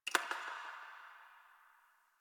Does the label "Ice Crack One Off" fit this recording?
Yes